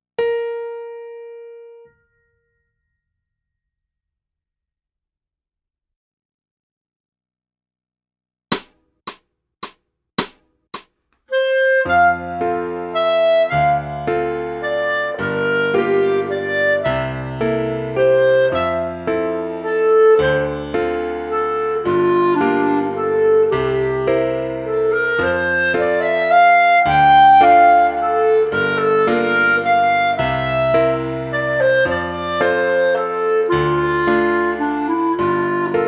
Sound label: Clar, Le bal des jouets